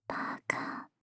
パーカー, ASMR; シャッフル睡眠ランダム再生用; 無声音ASMR; シャッフル睡眠法で眠ろう; バイノーラル音声

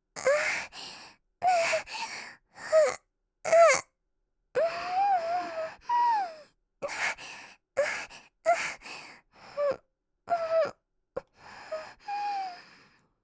セックス喘ぎ声と吐息; カスタマイズ用音源

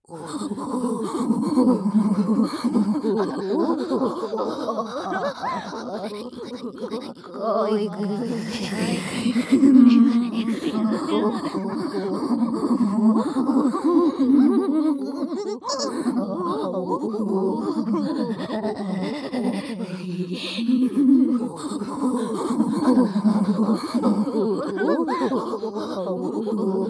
オホ声; ミオ

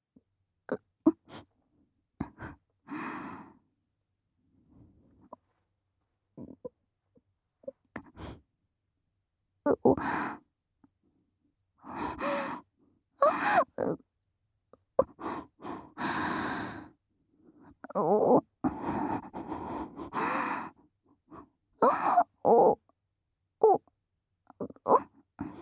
耳元吐息オホ声スローセックスループトラック; 異世界シスターの隠れた品性～村一番の清楚シスターさんの本性は、オホ声下品アクメ好きなよわよわ最弱おまんこの持ち主でした～

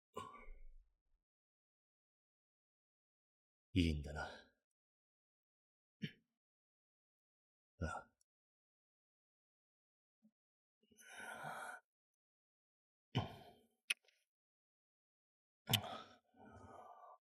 キス, SEなし; とある日の一夜; R18+; 極道彼氏～九条夏輝/俺が守る命と生活と～

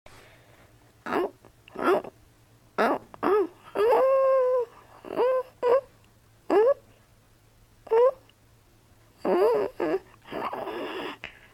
メス犬 怒って オホ声; ケモノ系オホ声; プライドの高い♪怒りっぽい♪Vtuber志望の♪ …交尾犬♪メス犬♪敗北♪快楽♪わんわんアオーおッおッおッ!?

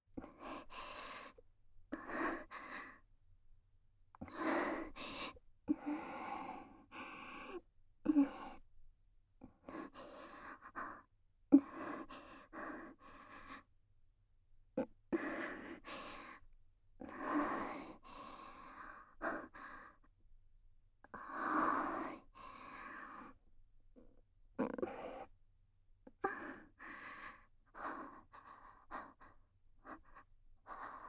お布団密着エロ（もぞもぞフォーリー）ループトラック, ASMR; 【フォーリーサウンド】耳かきASMR✕裏オプ援交～人気耳かき店の看板JKとリピ限裏メニューで恋人同棲生活してみたら～

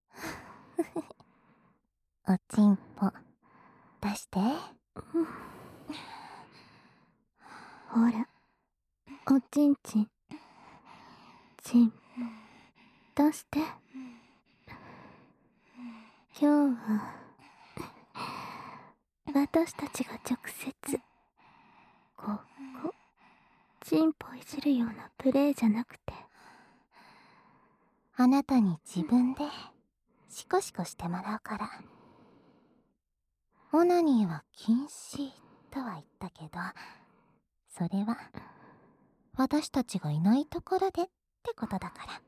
両耳舐め; 両耳舐めカウントダウンオナサポ; 裏部活メンエス部; 両耳密着ご奉仕プレイ

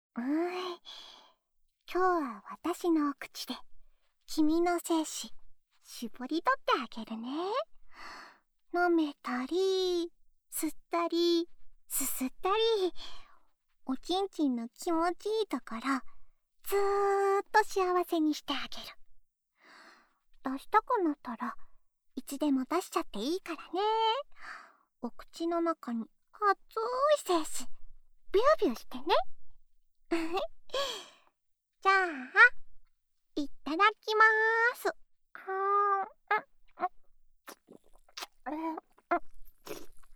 オナサポ用フェラチオループ, ASMR

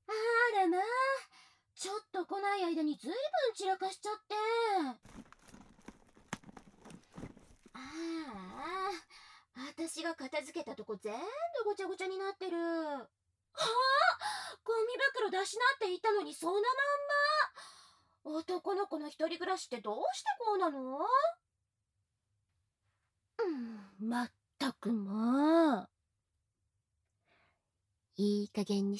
脱衣・耳舐め; ぐちょぐちょ; パンツコキ

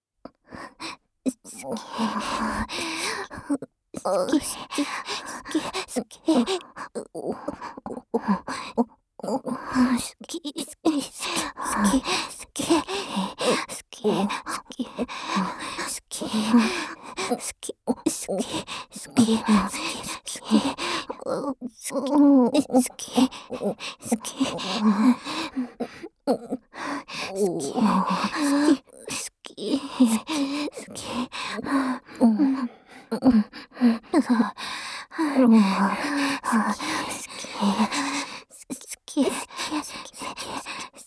サキュバス姉妹のWゆるオホ好き好き連呼喘ぎループトラック, ASMR; ダウナーオホ声WサキュバスJKと激狭ワンルームの生活音垂れ流しだらだらえっち同棲

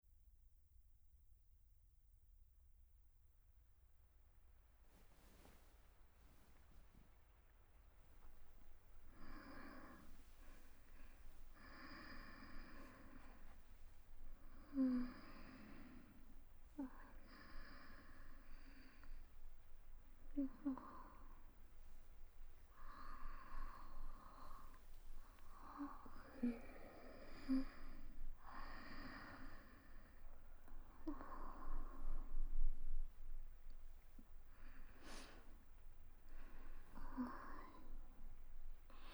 雨粒添い寝スロー耳舐め密着囁き

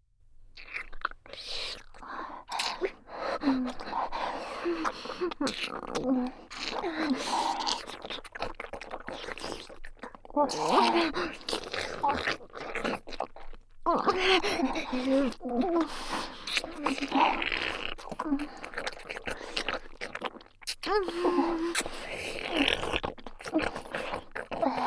Wベロチューループトラック, ASMR

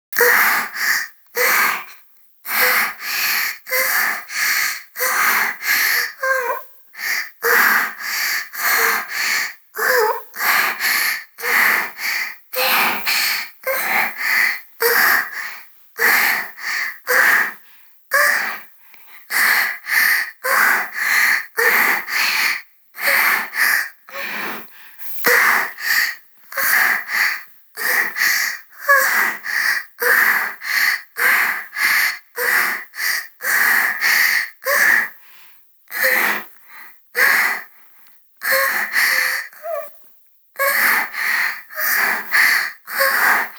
ピストン時の喘ぎ声 中, ゆっくり〜高速

